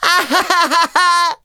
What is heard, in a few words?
Emote Laugh; the princess and the frog; Target Hungryfrogs